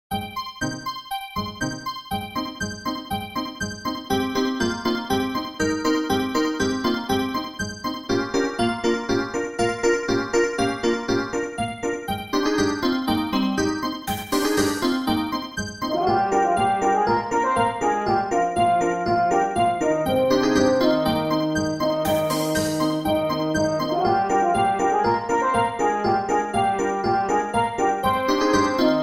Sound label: Creepy; super mario star road; Creepy Cap Cave